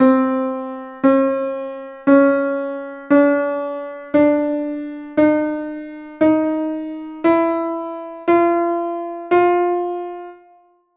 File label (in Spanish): Clausura modular